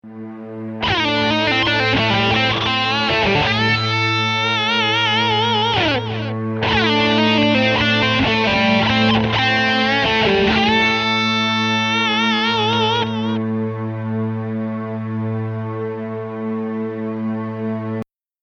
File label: Lick